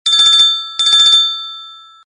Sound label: Old Phone